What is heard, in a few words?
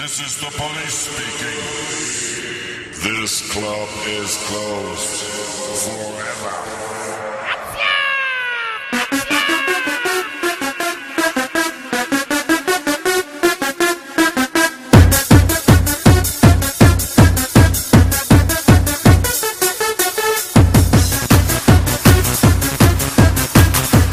Tecno Remix